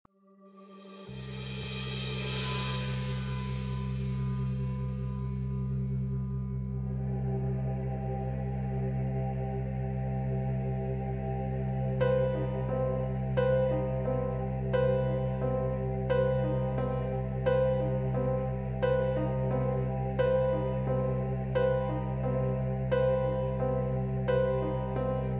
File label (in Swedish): Sovrummet med blås